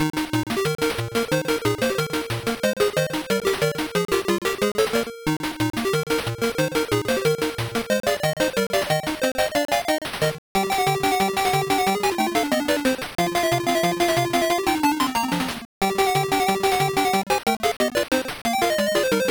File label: In-Game Music; Pengo; Game Gear